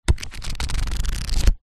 household book cub scouts record small flick through pages; zapsplat